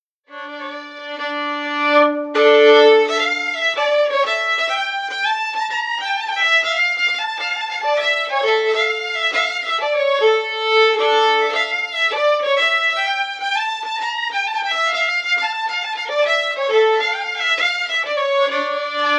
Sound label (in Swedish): polskan; Brunnsbergspolskan; Allspelslåtar